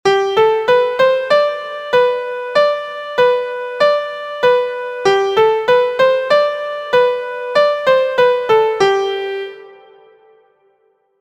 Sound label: MIDI